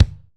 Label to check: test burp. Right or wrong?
Wrong